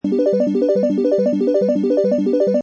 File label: Slot Machine Arpeggio Loop, Casino, gambling, Slot Machine: Musical; Casino, gambling, Slot Machine: Musical "Wheels Spinning" Arpeggio. Seamlessly looping